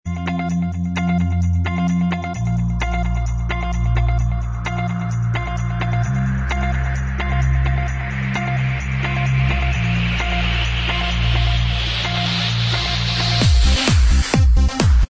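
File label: dance remix instrumental track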